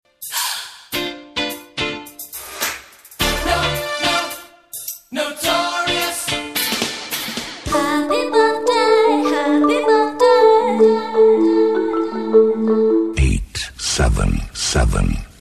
Happy Birthday, Jingles; Notorious Jingles

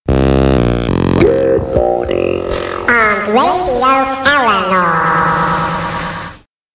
Jingles

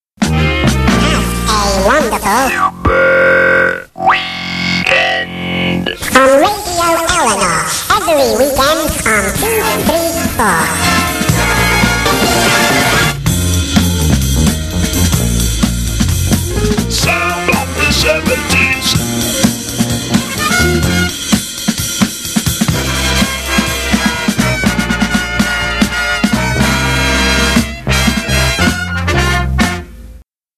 Jingles

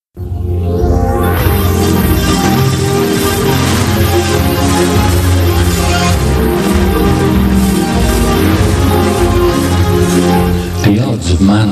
jingles; UFO

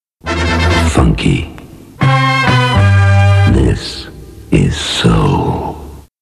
jingles, funky

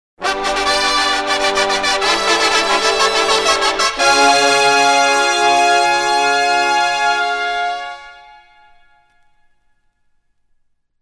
fanfare; JINGLES

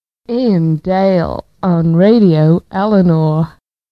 Jingles

